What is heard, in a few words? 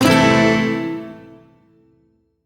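Shawzin Pentatonic Major Chord; Warframe; Duviri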